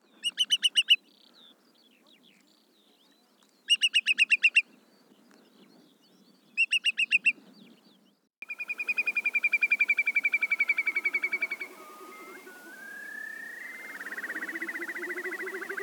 Scolopacidés Numenius phaeopus Courlis corlieu

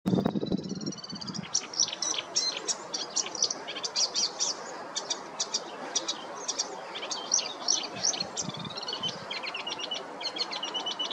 Acrocéphalidés Acrocephalus palustris Rousserolle verderolle

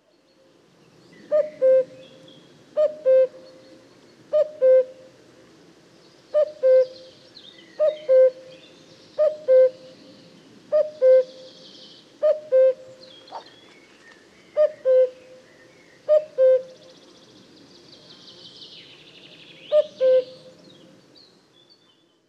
Coucou gris; Mhanfroy

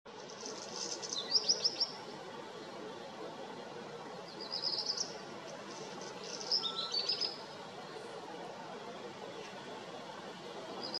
Rougequeue noir

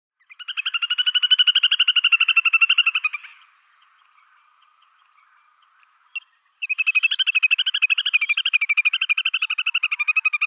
Grèbe castagneux

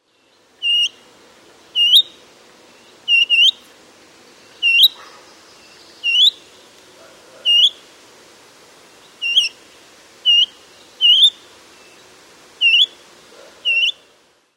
pouillot fitis cri alarme

